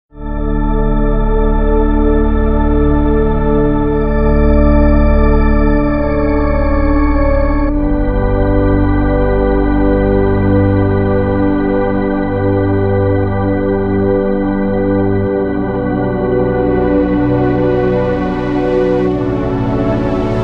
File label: Sequential Prophet X; Nameless Streets